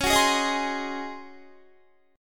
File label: C sharp Major 7th Suspended 2nd Suspended 4th Guitar; C#M7sus2sus4; strummed